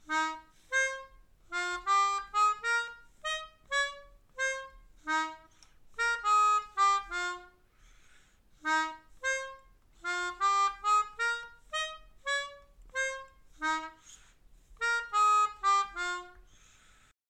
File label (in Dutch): Mijn Melodie, Melodica